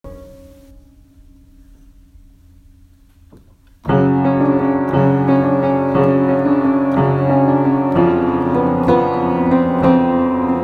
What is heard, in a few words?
piano instrumental